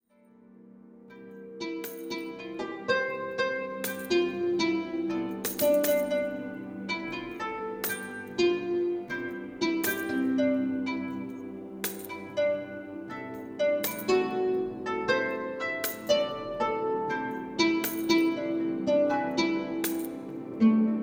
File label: Tavern Ambience